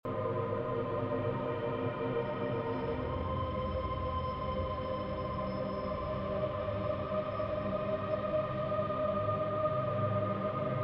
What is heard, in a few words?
drone